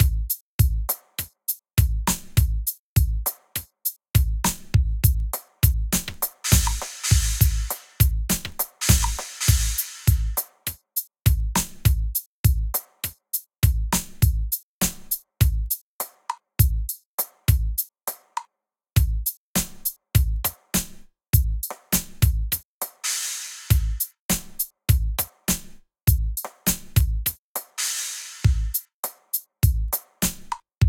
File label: drumline